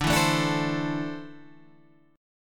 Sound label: D 7th Suspended 2nd down Guitar Half Step Down